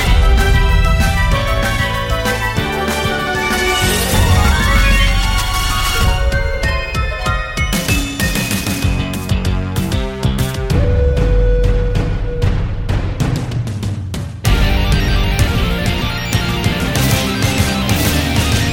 fight music

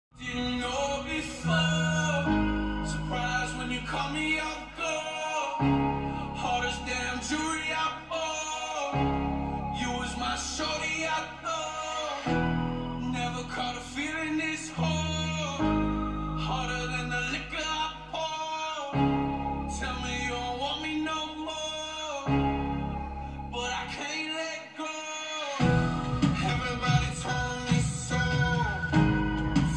Fall apart trap

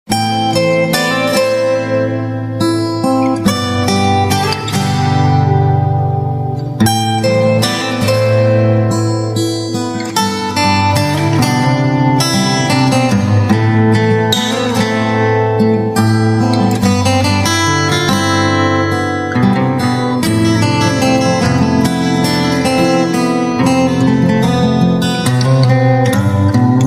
Awesome Guitar

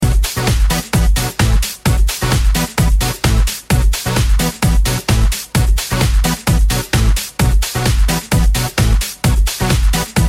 Electronik

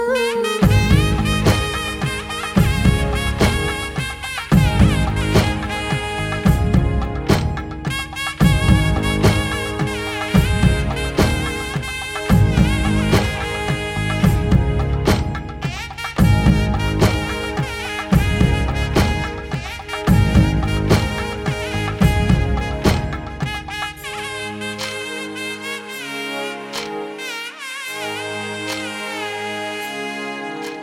Nice Music

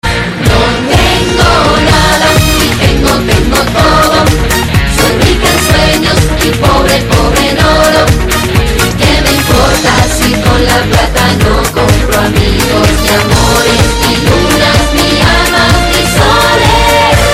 Nice Dance Song

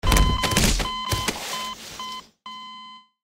Death Sound; Gmod

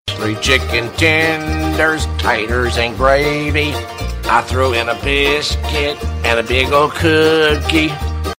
Jingle; KFC

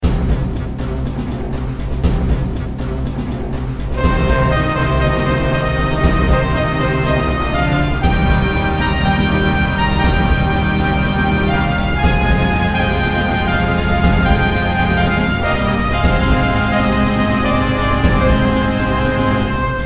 Super Hero Ring Tone

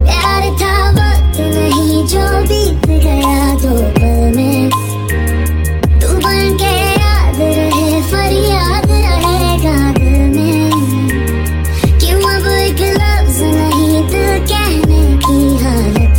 Sad Ringtone

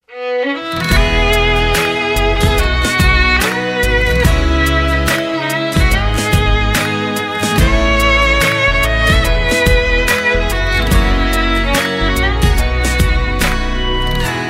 Goodbye Instrumental

